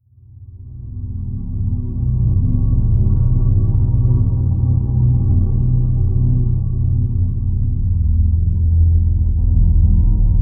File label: drone